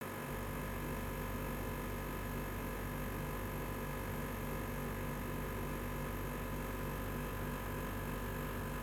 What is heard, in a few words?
Vibrating Air Conditioning Unit